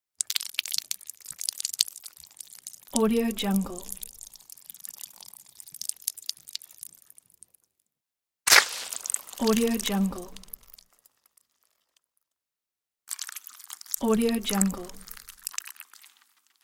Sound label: blood flows out of the wound